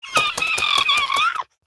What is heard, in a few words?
Snow Flipper Play